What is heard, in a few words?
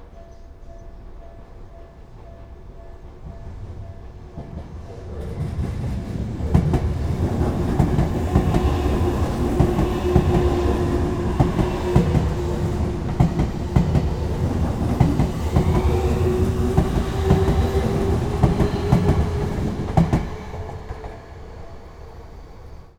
omni train